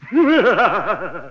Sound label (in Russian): eho smeh